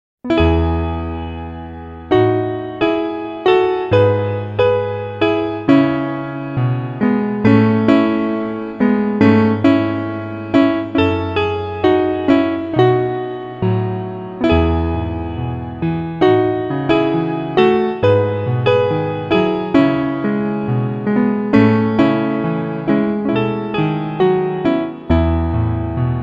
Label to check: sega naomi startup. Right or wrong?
Wrong